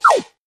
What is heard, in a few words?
click